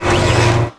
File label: mech arm; Tony Hawk's Project 8; XBOX